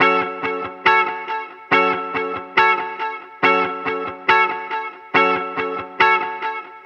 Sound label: guitar skank delay